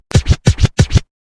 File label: DJ SCRATCH